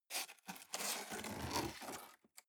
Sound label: cardboard box open scrape; objekte